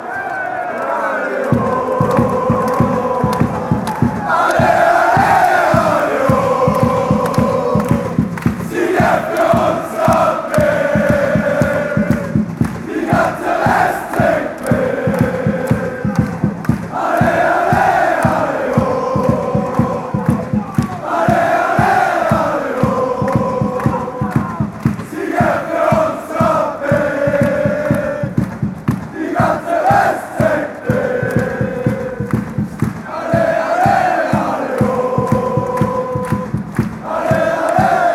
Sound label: sieg fur uns, fanchants; rapid